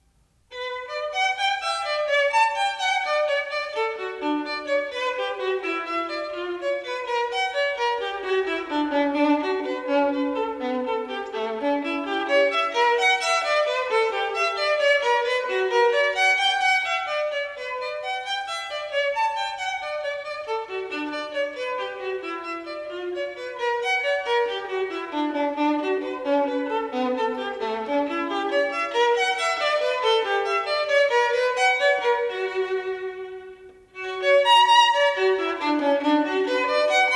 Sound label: Partita in B minor, Double, Solo Violin; Partita no.1; BWV 1002